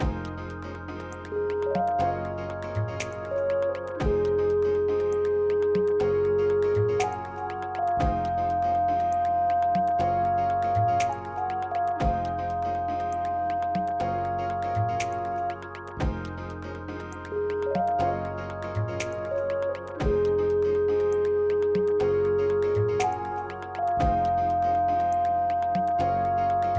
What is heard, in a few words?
Loop